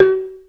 ZYLIMBA; Greytsounds VOL-1

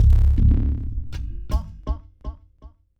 Udu

